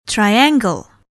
triangle

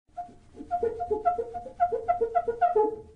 squeak

